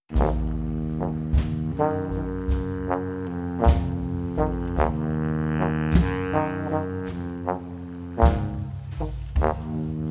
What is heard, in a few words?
BRASS BAND